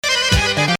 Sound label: Hijaz